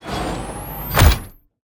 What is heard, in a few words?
Cannon Reload; Scraprun; Deathrun